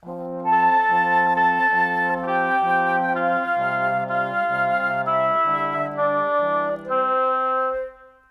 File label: Brass Winds, Orchestral; Sonic Foundry (Sony Creative Software) - Orchestral VOL-2; Modern 38